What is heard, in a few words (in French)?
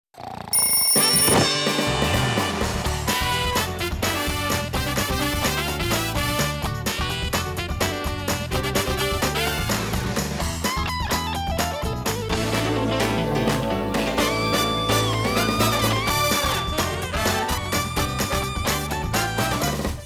generique; garfield; boomerang